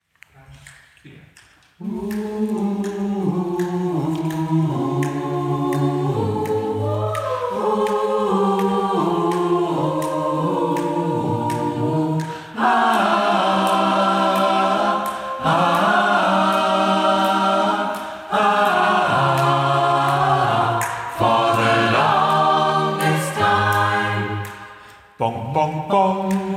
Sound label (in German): Tutti Uebung